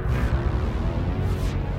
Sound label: triumph, Zaps and Beeps